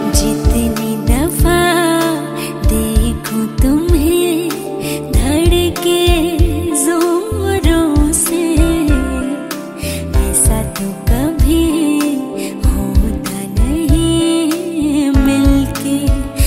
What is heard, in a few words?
female version ringtone